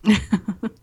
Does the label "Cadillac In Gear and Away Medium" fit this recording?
No